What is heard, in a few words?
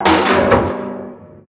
door stop